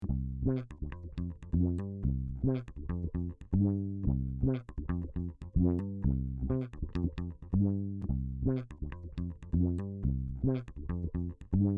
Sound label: Electric Bass, 12 db oct, Keyed; mutator demos